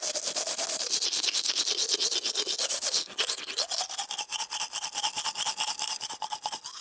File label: dentifrice